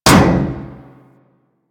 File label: Ship Hit Effects